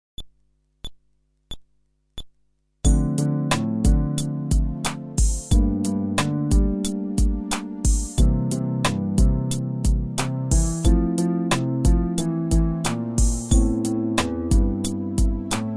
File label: Groove, fretless bass